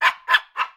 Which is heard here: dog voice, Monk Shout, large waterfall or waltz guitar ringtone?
dog voice